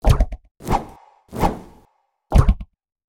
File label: Pet Doberman Come Here; creatures pets